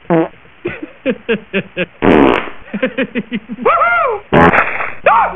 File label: fart; homer